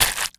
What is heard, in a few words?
octo steps; Garry's Mod; tf2